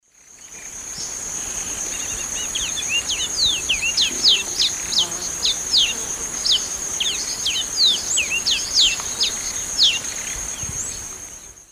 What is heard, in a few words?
Sporophila castaneiventris, song; S. America; Eastern Lowlands